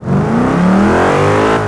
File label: first, vehicles; gmod; tdmcars; mer e63